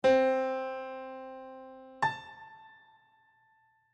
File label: music intervals major thirteenth